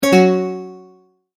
multimedia tone error

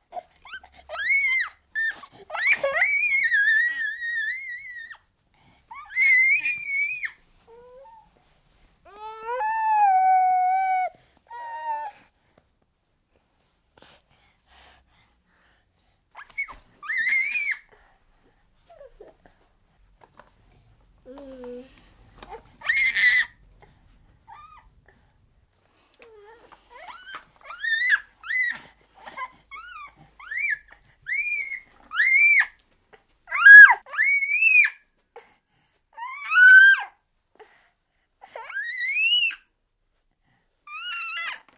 16 month old baby boy, screaming tantrum; Babies; Voice; BBC

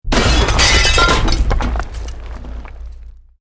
EXPLOSION MEDIUM BRICK WOOD GLASS NO FIRE

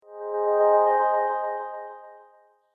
Fast Subtle Waiting, transition sound effects

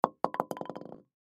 Bowling Pin Single Knock Over Cement; SPORTS; Bowling (Sound Effects:Sports)

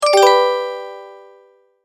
multimedia tone, Goodbye

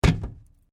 Cardboard box hit; Material components for constructing more complex sounds; Materials

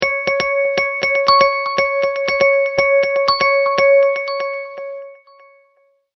Multimedia Tone-Thinking/Processing; Tones; Sound Design; McKinney Sound

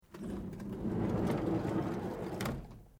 Car van old door sliding close slow; various vehicle sounds; Cars (Sound Effects:Vehicles)

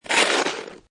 Snow And Ice Ice Slide Pass By; Weather

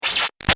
Shot Laser Light; Sound Design; BigRoomSound

